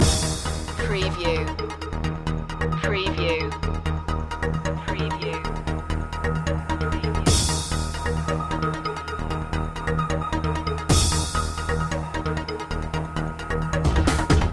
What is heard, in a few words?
push and pump loop